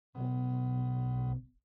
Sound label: Air Conditioner Angle